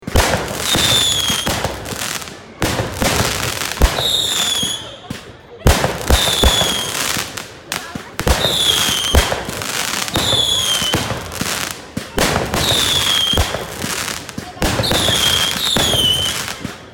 feierverk svist

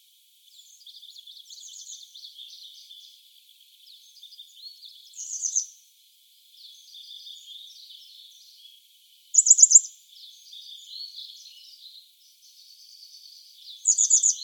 trjasoguzka gornaia

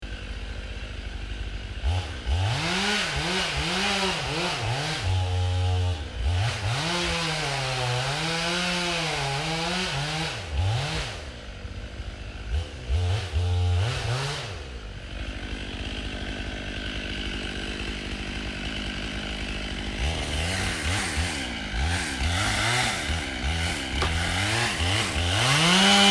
benzpila

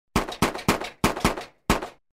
zvuki vystrela iz pistoleta

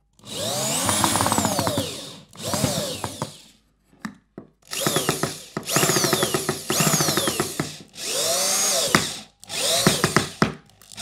shurupovert, zvuk shurupoverta

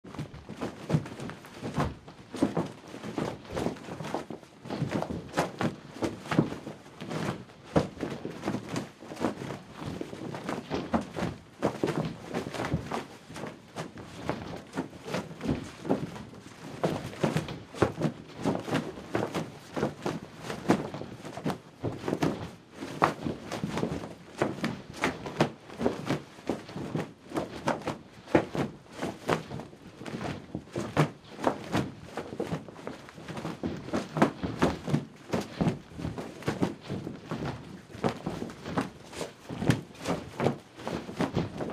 Razvevanie parusa

